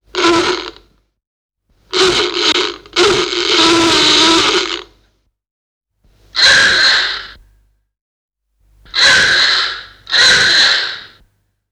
oude claxons

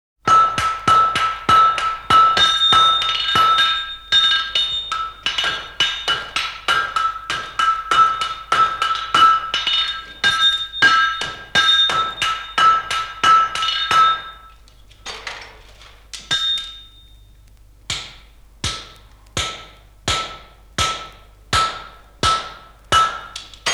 hoefsmid op aambeeld

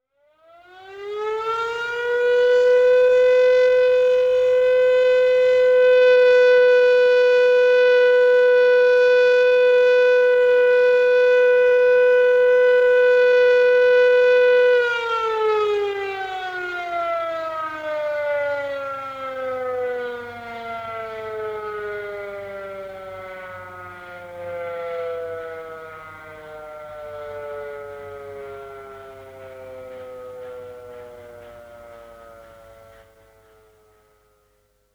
elektr centr sirene

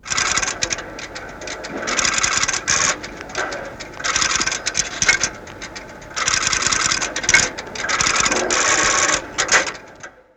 telefoon relais aanslaan

